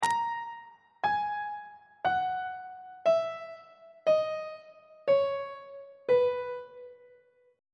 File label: b major scale descending on treble clef